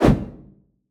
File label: swipe